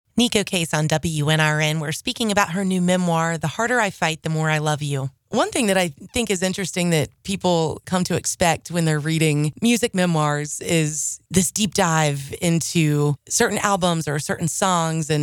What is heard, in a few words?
music background; Fund Drive Book Day